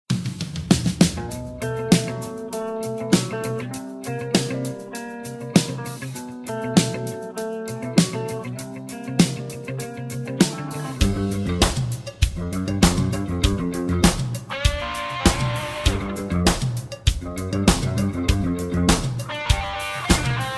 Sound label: (G) No Backing Vocals